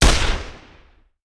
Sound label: Hand Gun; Sound Ideas; Guns